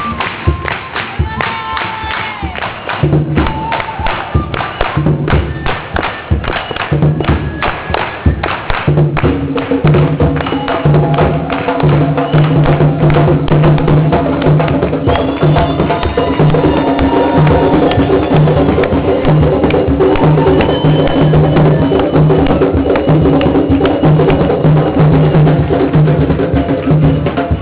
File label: drumming outside the gate